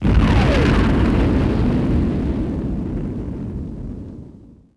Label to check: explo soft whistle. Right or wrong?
Right